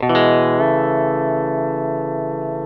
E TO A, Pedal Slides, Earth Tones

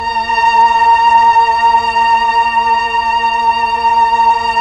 PAD, Slow Str+Vox

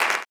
CLAP, blues & soul; ILIO; Vocal Planet

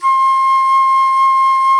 flute C5; Greytsounds; Scott Peers Sound Engineering

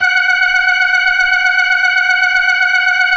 F#5F, B3, Vintage Keyboards

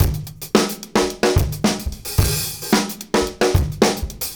Live Beats, R&B Drums

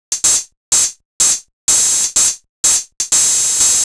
drum loop jacker hat 125, Classic Chicago House; Sample Magic; Drum Loops

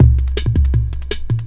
Digital 162, breaks

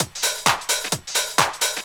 drum 130, techno trance drumloops

